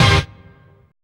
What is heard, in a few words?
dance chord